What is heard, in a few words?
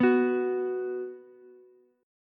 B 5th B down Ukulele; B5 strummed; chord shape; JGuitar